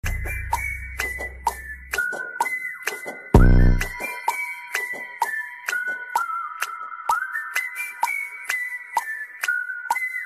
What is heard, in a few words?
whistle bgm ringtone; guntur kaaram; mahesh babu